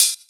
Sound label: Hhat, Hihat; Equipped Music; Loungin House